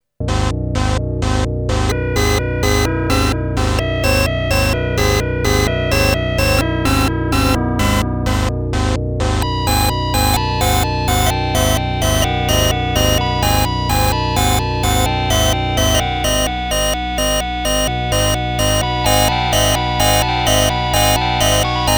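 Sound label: fx noise, analog polysynth; Solton SM100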